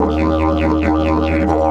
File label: 140, DIDGE RIFFS